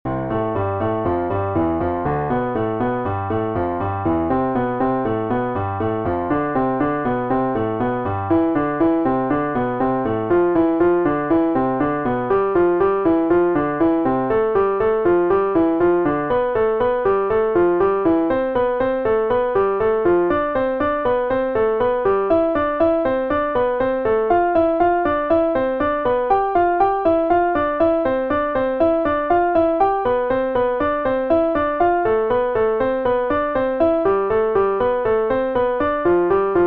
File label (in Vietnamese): bai tap luyen ngon piano